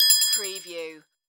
Multimedia Bell

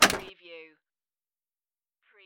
Pinball sound, bumper knock

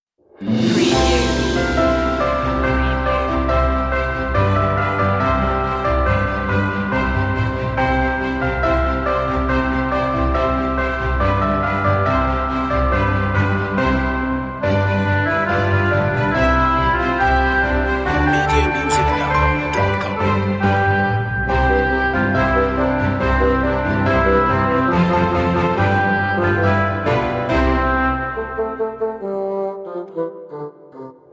orchestral loop intro; deck the halls; media music now

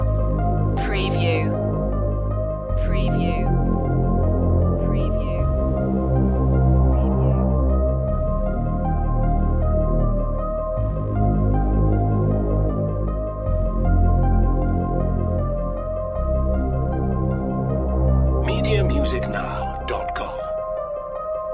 synthetic mystery loop

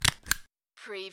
stapler stapling

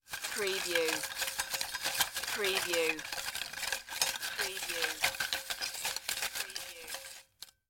Paper Spinning in Metal Pot (Ticket Cage)

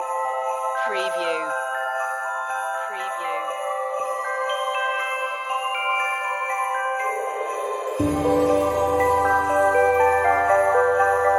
fairy dream magic christmas remix long looping

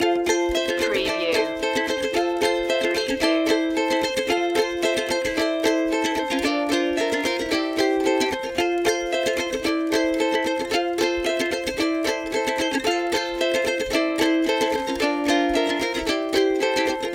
carnival loop

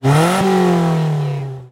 Car Motor Engine Rev Engine Perspective

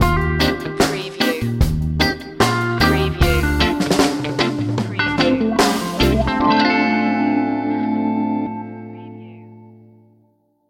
summertime reggae stinger

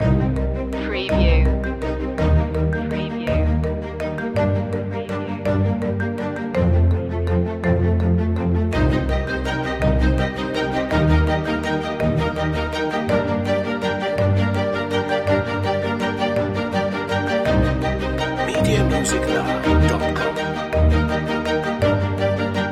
stirring times loop

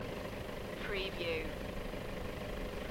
Diesel engine tickover loop; beanstalk audio

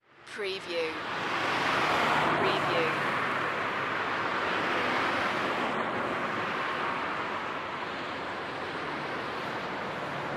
Medium to Heavy Night Traffic

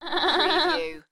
Annoy Laugh

